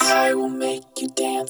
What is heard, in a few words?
Vox FX; Sonic Foundry (Sony Creative Software); Electrocution